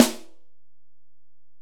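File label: Acoustic Snare, Alesis DM-PRO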